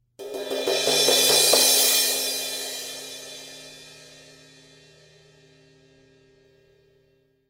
S Medium Thin Crash Build Crash, cymbal sound

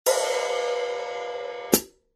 14in Mastersound HiHats, cymbal

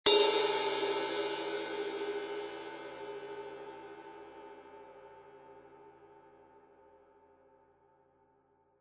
20in Custom Ride, cymbal

